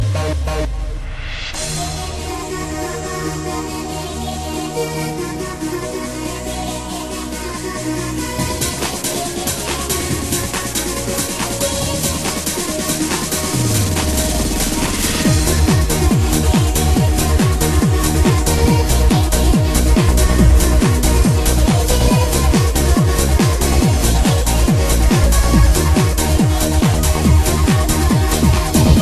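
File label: tehno muzika, techno music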